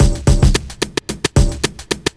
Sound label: block rock kit